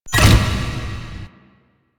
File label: Vaults Stairs; Warframe; Entrati